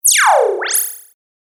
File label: Starship Engage Force Field; Spacecraft Sound Effects; science fiction sound effect